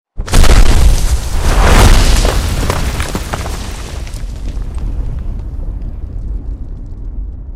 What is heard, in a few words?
dragon land crash long; npc